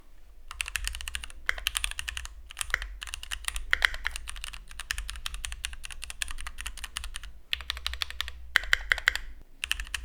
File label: keychron q3